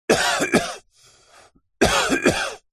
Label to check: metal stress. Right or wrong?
Wrong